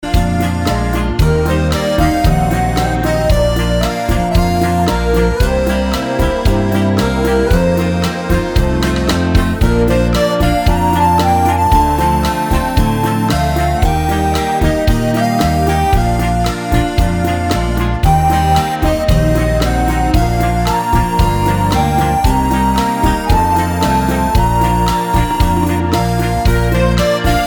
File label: mix bachata